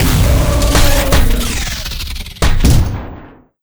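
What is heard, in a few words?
boss death, monster; Bizen; steamercrazy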